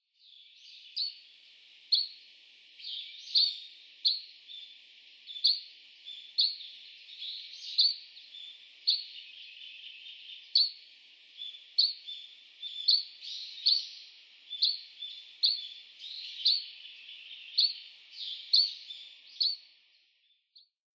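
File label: Oriental Greenfinch; kawarahiwa; utsunomiya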